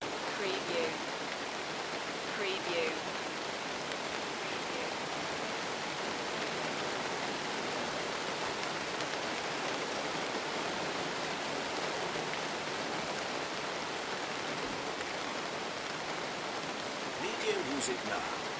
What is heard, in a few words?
Very heavy dawn rain in Anglesey Wales; beanstalk audio